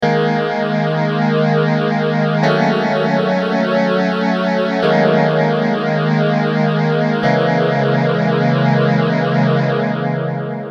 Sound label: m1000 ibanez ph7